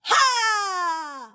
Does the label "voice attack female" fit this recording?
Yes